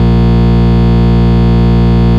Root A#, Ultimate Bass; Vengeance Samples

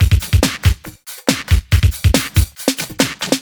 Breakbeat; Vengeance Samples; VEC2 Loops

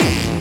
BD Distortion, Bassdrums; Vengeance Samples

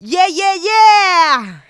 vocal house adlibs; PS-28 Vocal House Samples

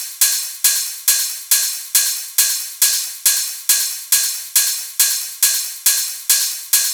138BPM Kit; VFH1 138BPM The Epic Kit

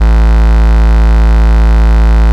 Bass Root A

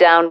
Vocal Oneshots; Vengeance Samples; Vocals

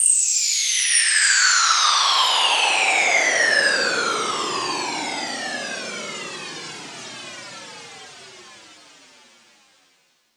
Downlifter

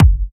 Bassdrums; Vengeance Samples

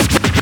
Loops Fills; Vengeance Samples

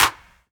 Claps

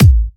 130BPM Kick; Vengeance Samples; VFH1 130BPM Remnant Kit

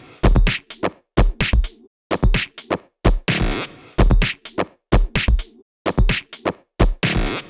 128BPM Air Raid Kit

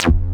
Synth Shot; Vengeance Samples

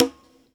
Bongo; Vengeance Samples; VEH1 Percussion

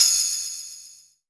Open Hihats, Cymbals; Vengeance Samples

